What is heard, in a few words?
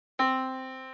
yamaha Disk